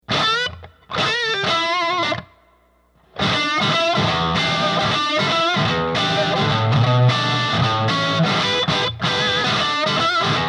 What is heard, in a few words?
Boutique Texas Blues